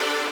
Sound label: lead